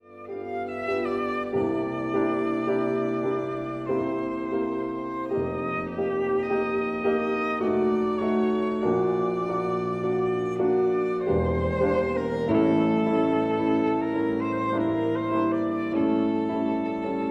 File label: VIOLIN